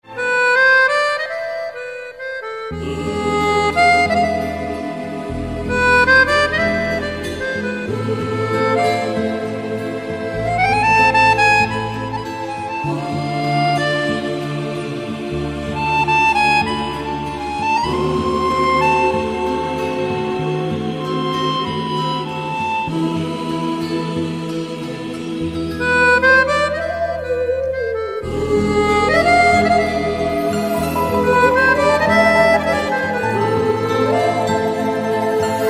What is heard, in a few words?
Mobile ringtone